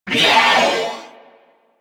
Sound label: Purgatory Warrior Death, Barks; Warframe